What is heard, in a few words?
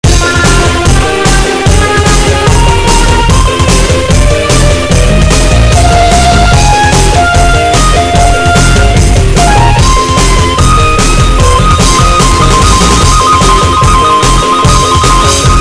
Metal